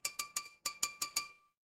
glass glass tap

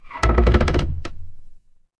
door creak

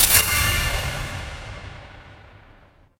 magic scifi portal

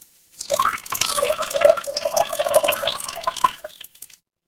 water pour

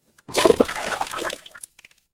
water splash

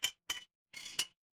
glass ceramic

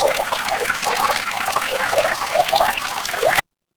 water stream

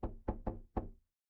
impact wood knock

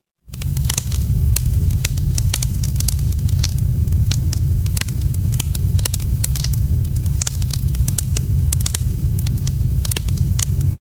ambience fireplace

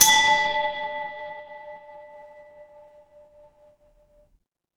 instrument bell

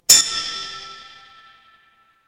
instrument bell

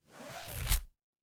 paper fabric zipper

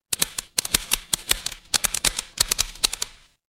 mechanical gear

